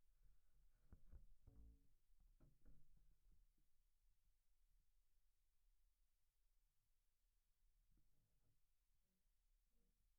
Bass DI

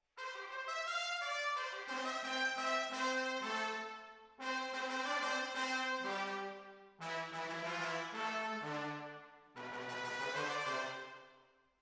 Hall, Jazz for piano

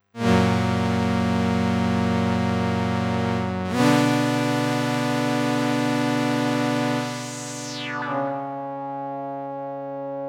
Synth

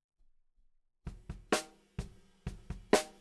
Snare Bot

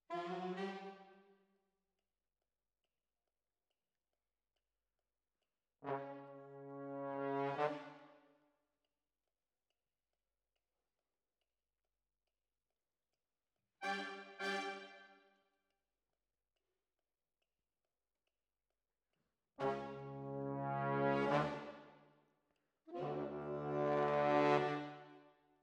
Trombones, jazz for piano

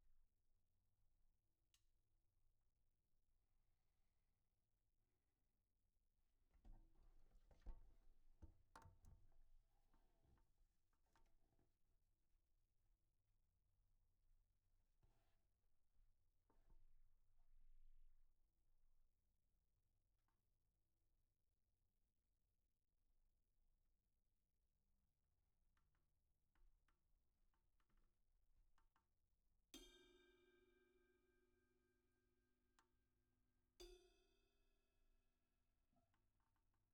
SNR Bot

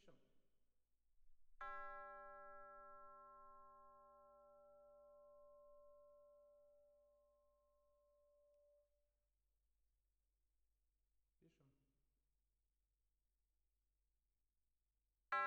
Twin F Perc

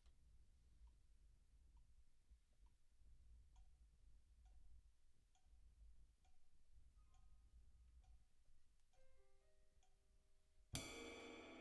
Drums

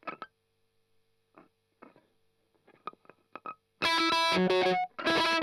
Strum Octav

